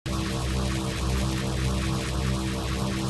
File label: Ufo Laser